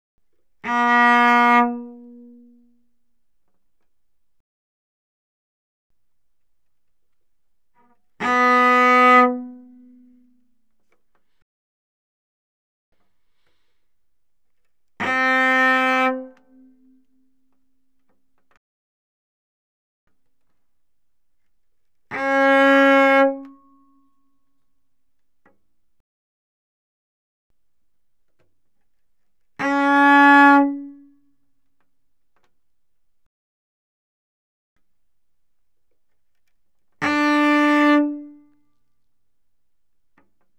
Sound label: Cello arco ff sul G B3Gb4; Strings